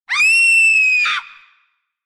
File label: scream